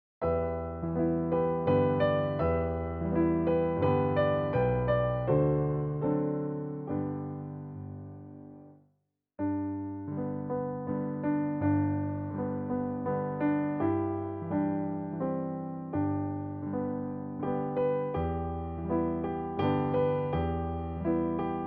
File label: Waltz